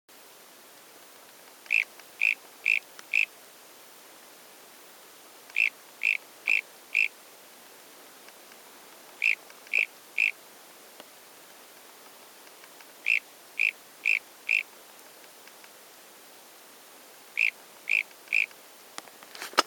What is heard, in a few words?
Pristimantis nervicus